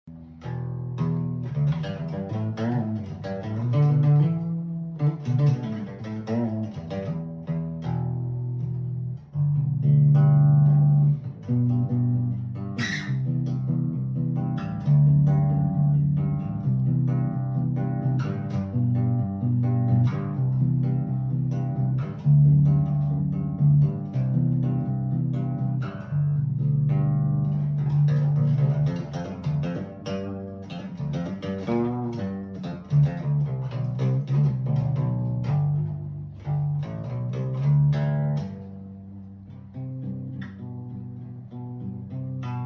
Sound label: Bass